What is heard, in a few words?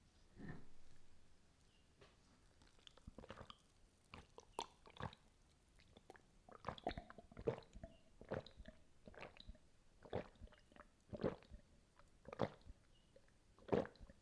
drinking water